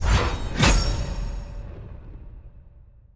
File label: player join; clash